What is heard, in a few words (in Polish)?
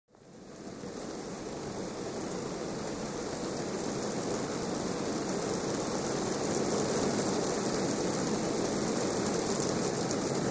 Odglosy deszcz wiatr burza